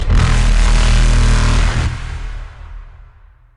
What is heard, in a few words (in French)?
Bruit bluff